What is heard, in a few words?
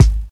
raw kick drum single hit g sharp key, bass drum sample tuned to the G# note